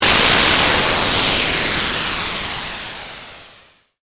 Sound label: explosion